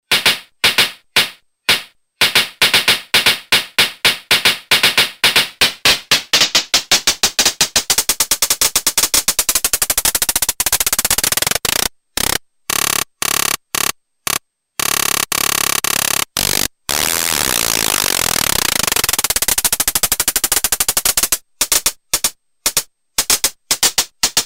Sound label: Sample Ratchet; Io Instruments Himalia